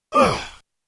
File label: pain